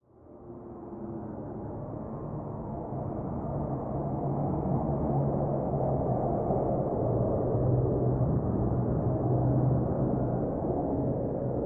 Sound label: Creepy Ambient